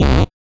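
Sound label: Rave Synth, Dance Mega Jungle Rave; Best Service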